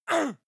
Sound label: male moan pain short soft; koerpergeraeusche; menschen; soundpool